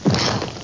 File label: skwush; graveyard